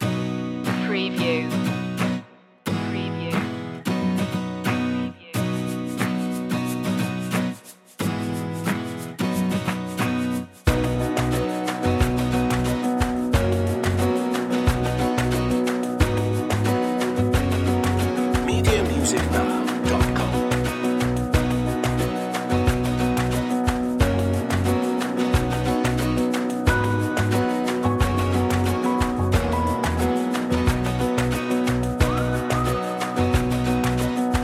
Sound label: happy clappin